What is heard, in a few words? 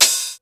HAT, Hip Hop